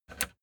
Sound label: plastic button latch release disable